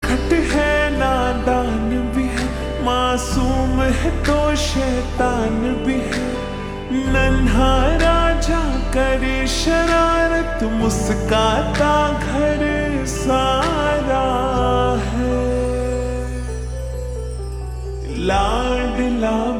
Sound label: Ringtone Slowed Reverb